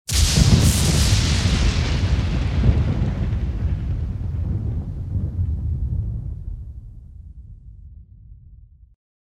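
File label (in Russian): молния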